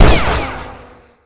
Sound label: Gun Sniper Rifle Shot; Weapons